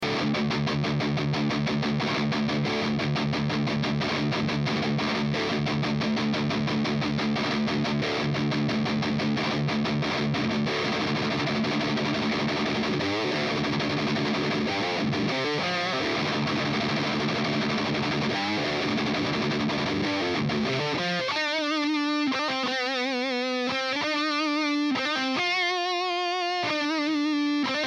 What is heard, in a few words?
Fishman Fluence Modern V1 JCM800